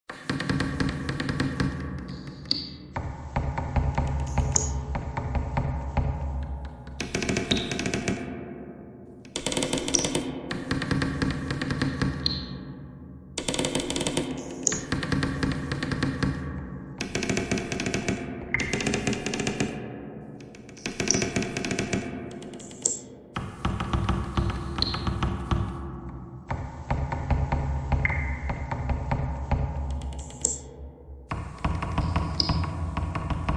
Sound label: bagpipe thread noise; reverb